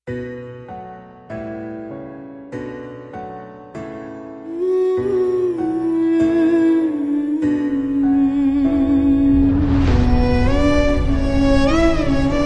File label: BGM Ringtone; Drushyam 2